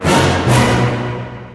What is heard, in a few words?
concerto, notifications; Sony; Xperia Z3